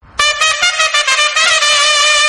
siren; league cricket theme

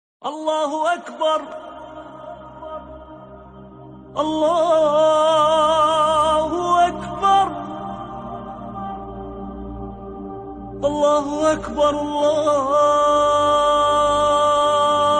islamic azan theme

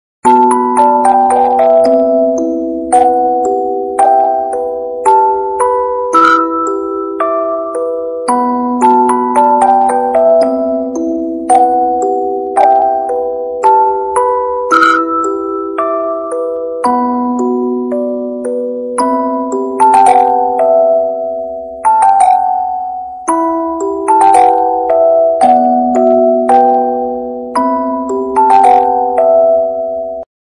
new flute background music

